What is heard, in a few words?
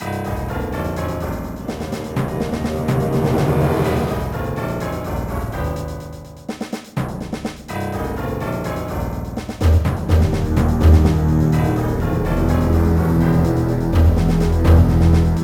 Action; Aliens Infestation